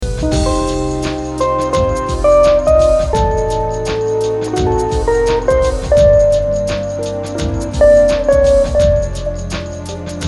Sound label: bgmusic